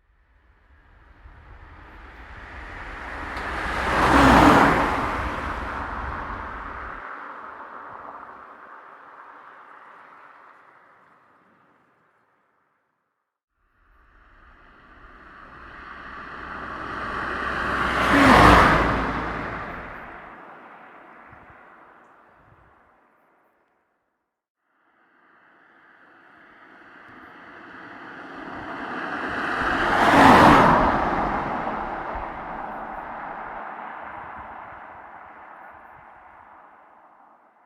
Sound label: Car Passing; Car Passing Sound Effects